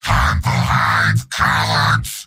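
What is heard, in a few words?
yell, robot; heavy; mvm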